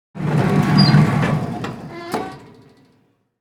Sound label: Service elevator arriving door open